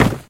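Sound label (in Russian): звонкий сильный удар в деревянную дверь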